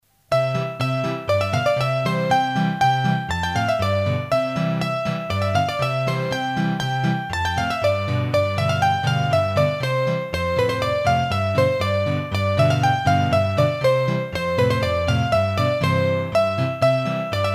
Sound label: Polka Piquee, hurdy-gurdy